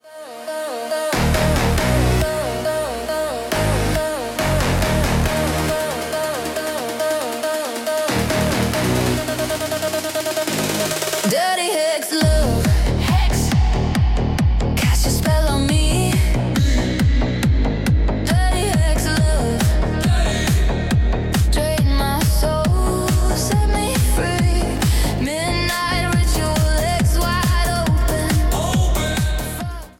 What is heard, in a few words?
hardstyle